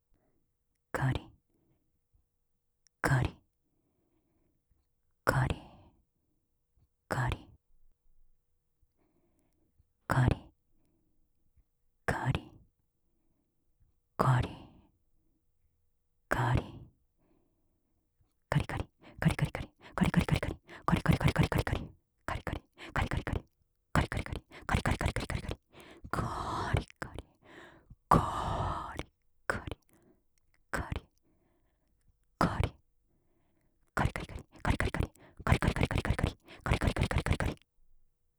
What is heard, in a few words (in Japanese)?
カリカリランダム; ASMR; オノマトペ; 右耳; 上官